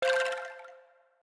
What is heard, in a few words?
Safari close; Nokia; audio themes